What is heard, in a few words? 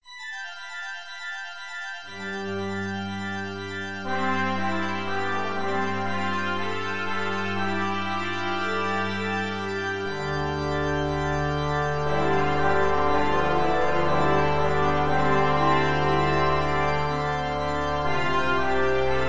Ostinato Quartet